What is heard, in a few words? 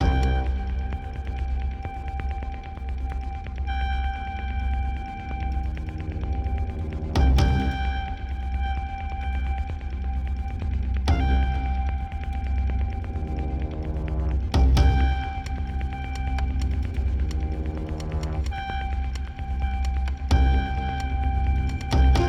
Naval Music Fast Med Alarm Sounded; Battlefield 4